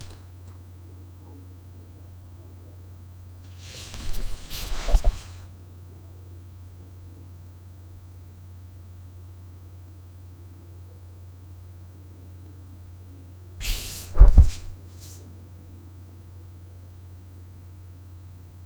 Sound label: clothing rustle